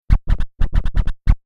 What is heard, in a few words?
Turntable Scratching; DJ (Sound Effects:Musical)